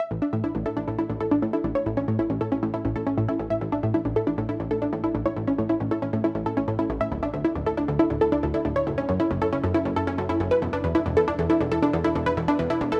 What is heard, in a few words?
Mainpluck WET, 137BPM, A major; Unlooped